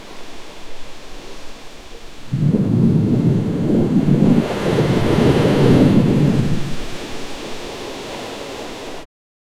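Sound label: big stomping on sand ocean, gorilla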